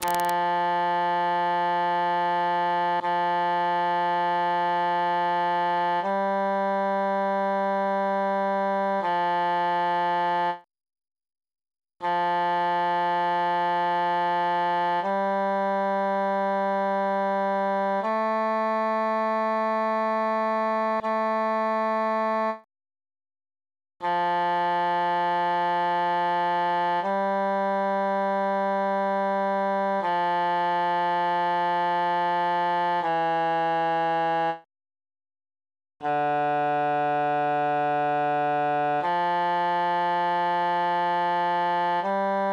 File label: tenor solo; chanconnete ainc voir a le cheminee par verite